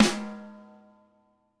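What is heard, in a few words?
Jazz kit snare drum, Drums Acoustic; AKAI; 90s sample CD; USB Soundscan vol.10; JAZZKIT 3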